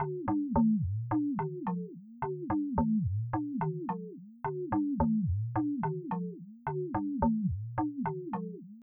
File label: BGM, Music; ゆめ2っき